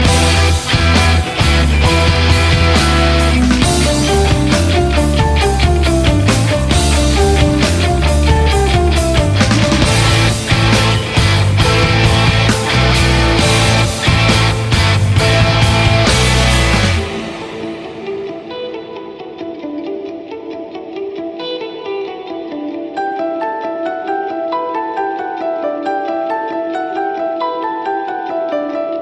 guitar; Death Note; L theme

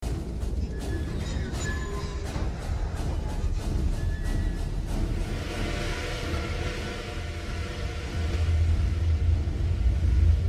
dolby digital 5 1 train

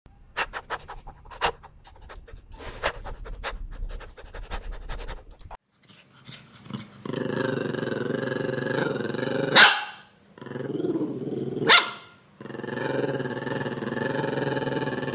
chihuahua

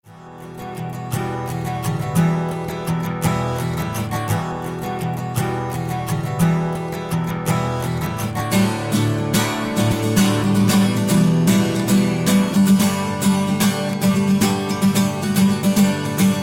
acoustic rhythm guitar

